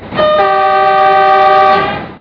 klaxon, Train; Siemens ES64U2

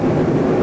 Motor, Train

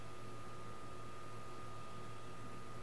Loop, Train; OpenBVE

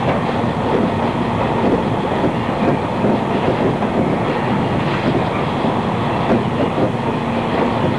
Run, Train; OpenBVE

